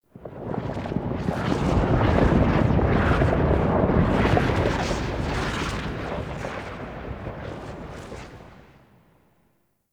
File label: Wind Crackle Rumble; Tau